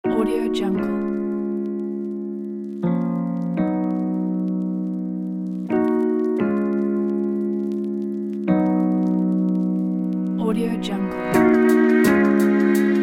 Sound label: motivational hiphop